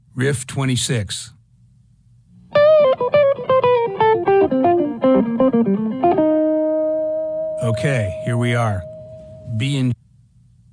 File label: Riff